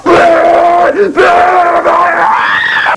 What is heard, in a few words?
mad screech